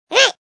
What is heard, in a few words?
Gingerbread Explode; unity